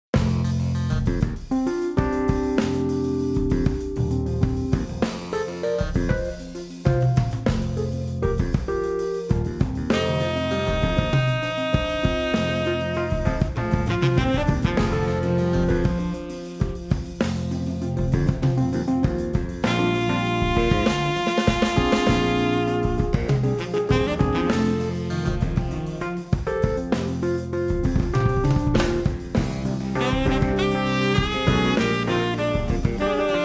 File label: Funk